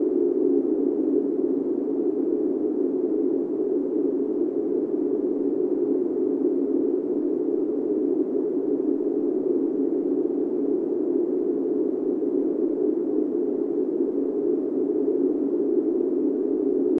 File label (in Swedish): vind